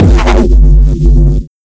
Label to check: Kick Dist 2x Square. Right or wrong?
Right